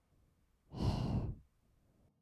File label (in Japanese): へ, ブレス, 吐く音, kawaii voice; ASMR; 1200 kawaii voice!!